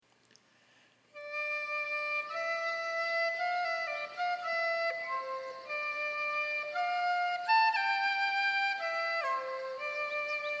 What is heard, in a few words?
melody